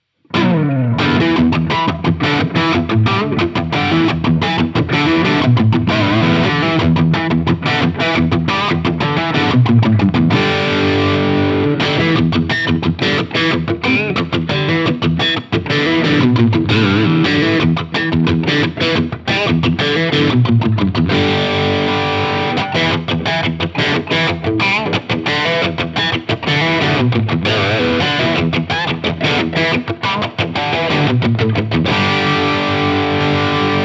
BC Rich Mockingbird Crunch Humbucker Single Coil 3 Way Switch